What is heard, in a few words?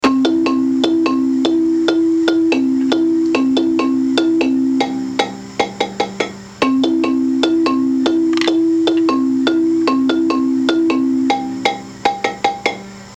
bari solo, marimba; manhanga